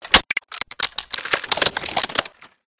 Granular Mechanical Sequence Glitchy Futuristic; PMSFX

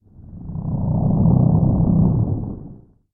science fiction alien creature growl; sound effects one

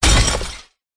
impact hit hard broken glass short slam; zapsplat

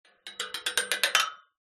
metal bars object rattle drag between; foley

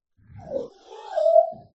science fiction alien vocalisation

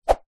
cane whip swish through air

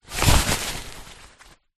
foley rain jacket arm into sleeve; zapsplat

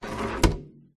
musical drum tom set down

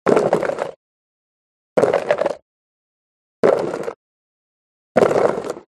HORSE Hooves Clack Stop; ANIMAL; smartsound

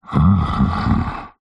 horror yeti monster groan happy content; zapsplat

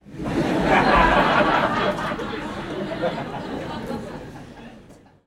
human audience laughter comedy club x200 people; komedia brighton comic boom

